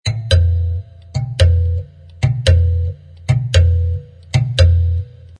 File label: marimbula